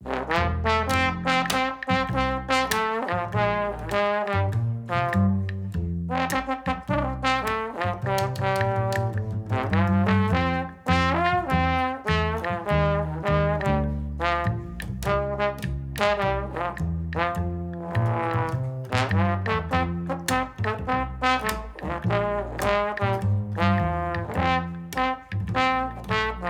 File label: Music Horse